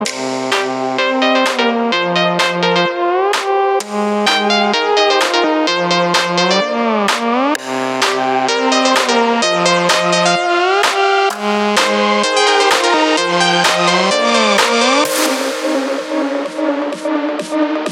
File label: Instrumental